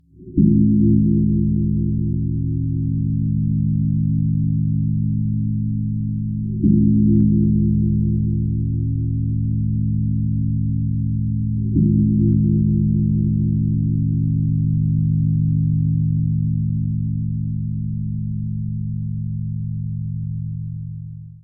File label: Hell Bell